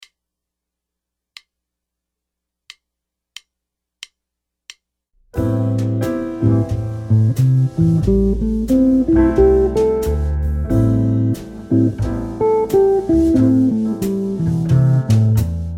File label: arpeggios, guitar